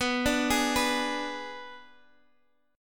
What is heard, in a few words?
B Minor 6th B, Guitar Open D